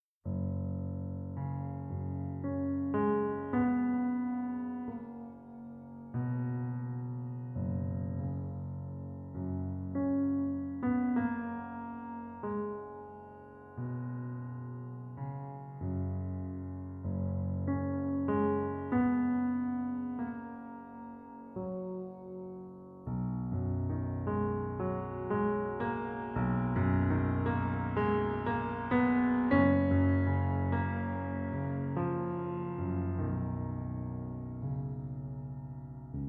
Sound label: Nocturne F-sharp major